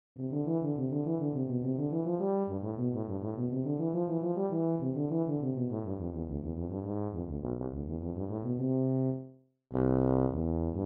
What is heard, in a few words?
Tuba